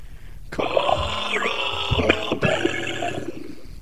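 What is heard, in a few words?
singing male western power residual normalized, Residual component normalized to -16dB RMS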